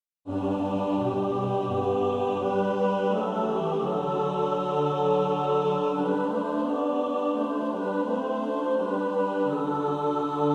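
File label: mixed, practice music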